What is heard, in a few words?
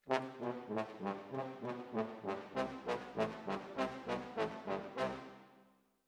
Trombones, jazz for piano